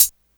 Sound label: pedal hi hat one shot d key, hi hat tuned to the D note; hits one shots; hat samples